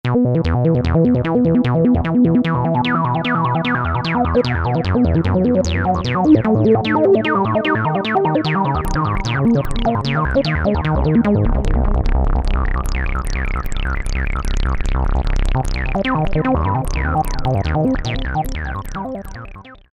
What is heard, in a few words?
Legato TB-303, Roland JP-8000; Factory Performances